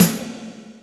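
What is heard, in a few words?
Snare, Alesis D4 drum machine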